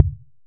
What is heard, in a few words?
impact Metal; Kenney's Sound Pack; Sci-Fi Sounds